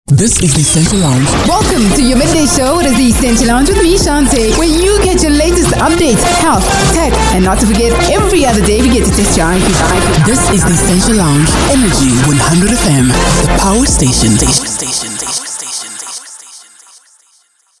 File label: Essential Lounge Promo Energy 100